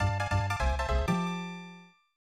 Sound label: Lose Life; bonkers; snes